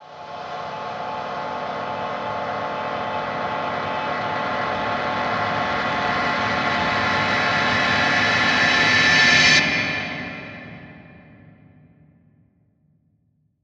Ugly Scrape

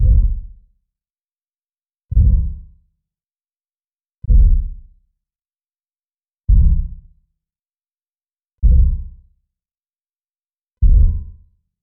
Impact Thud Claps

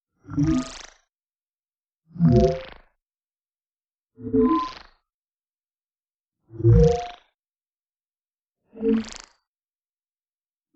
Electro Periodic Whooshe

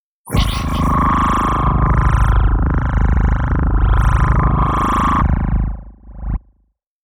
Robo Body Electro Rising High

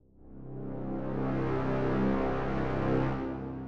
Dramatic Brass

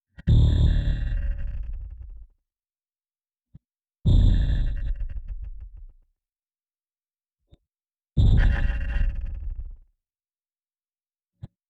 Impact Thud Bump Periodic

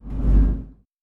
Whoosh Slow Deep; Whooshes

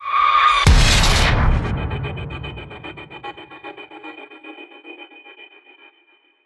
Tension Builder Impact

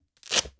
Velcro

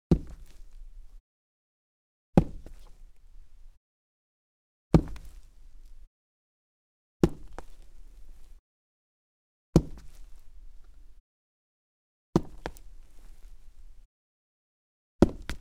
Cement Dress Boots, Footsteps

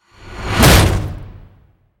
Short Impact

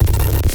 Digital Destruction; Horror

